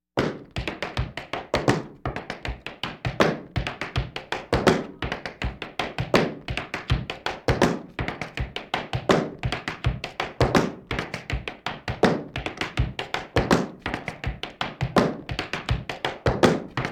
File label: Laugh tracks